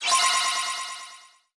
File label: Reward Tokens Sparkles; subway surfers city sound effects; Android, iOS